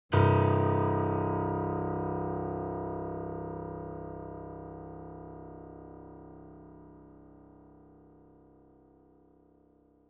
C1 E1 A1 Grand Piano & Sample Piano; Sound Sampling Collection VOL-3; Grand Piano 88

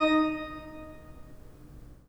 PIPE, Organs; E-MU Producer Series Vol. 5 – 3-D Audio Collection; 3D Pipes Dr Joyce

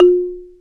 Ambient Bala, Xylo; Roland LCDP11 Africa VOL-1

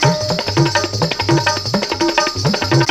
TABLAS, Bhangra; AMG - Now CD-ROM (Roland)

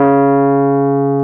PNO, Electrics, Rhodes

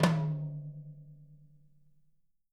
SD TOMS, Living Drums; Sampleheads; Peter Erskine

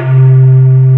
PANFLUTE C2; Big Fish Audio; Synth City

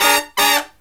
SLEEP, Killer Brass Riffs

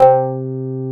Korg CX3, C 1

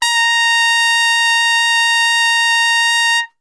A#, Brass; Best Service; Brass Super Section; TRP STRGHT

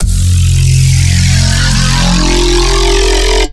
growl normalized

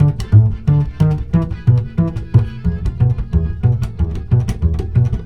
BASS, Balkanish Traditions

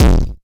Elekt E Kick; Yamaha PSR E305

